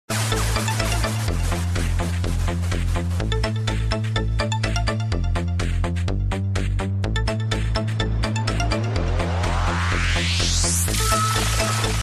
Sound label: beat ringtone